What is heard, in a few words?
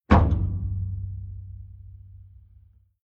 Deep Hollow Metal Impact Stinger Single Thud Drum